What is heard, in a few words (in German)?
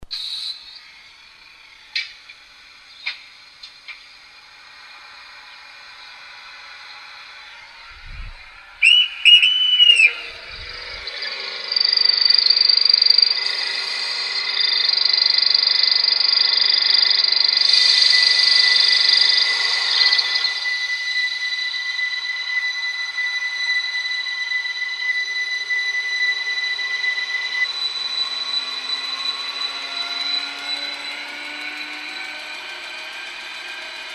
Tonbeispiel, RhB ABe8 Allegra